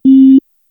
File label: a short 0.5 second ui erro, error